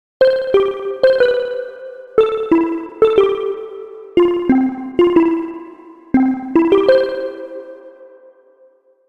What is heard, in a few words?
Vibra, synthlead